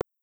foot

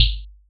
hit

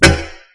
tap

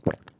slurp SLRP

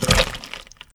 gut SKREL

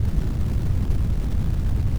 fire

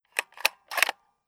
eject

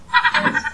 seagull Bark Transformed

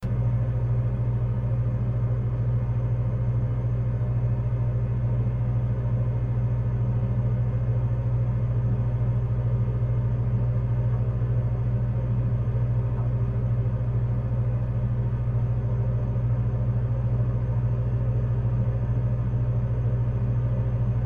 fridge Hum

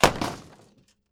stone CRMBL

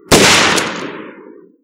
shotgun

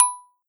marimba B6 DING

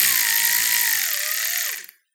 whirdown Small

